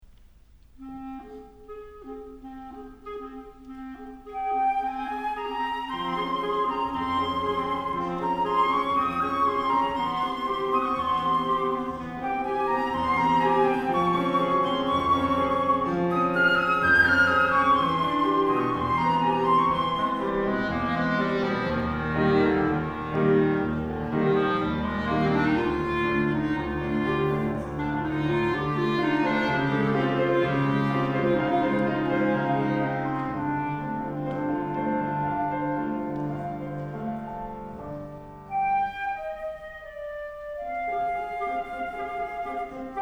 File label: doppler fl cl pn